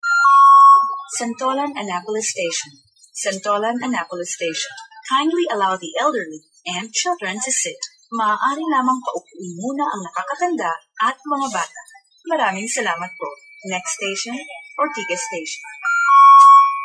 mrt station; santolan

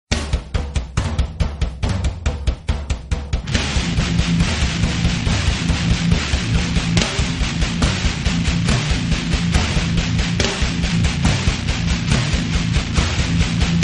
iphone3 guitar ring; ringtones; sms

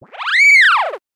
Strange Slip Sound

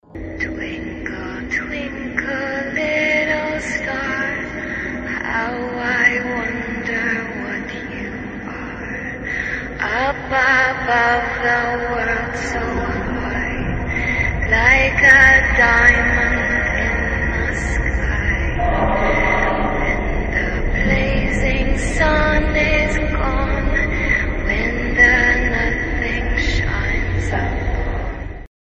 evil twinkle, ringtone

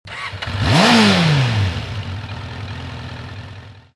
Bike Start Sound

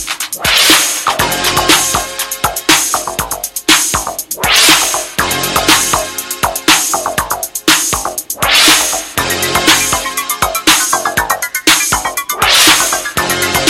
clear beat

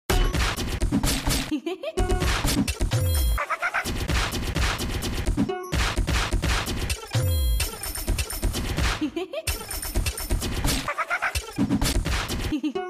LOUD GAMING

